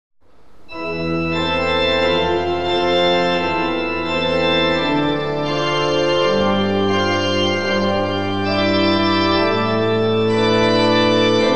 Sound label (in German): Freie Improvisation; Behmer